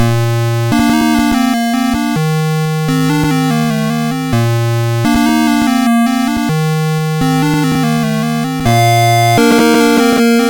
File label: Musicbox, MOS6581R4